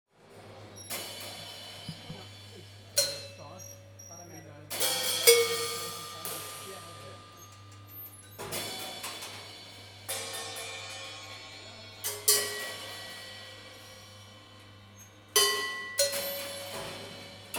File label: Basel Tinguely Museum